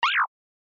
Motion Pop

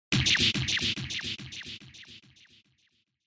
Cut

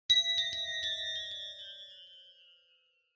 Ding Delay; Onoma

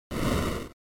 Noise Phrase, NES; NES-General

